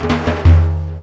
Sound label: arabic perc, Arabic Percussion Kit